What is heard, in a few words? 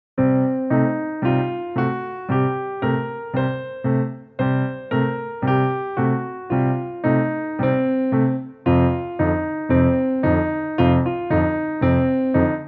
bluesy